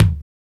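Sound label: lively kick; NATUR. K1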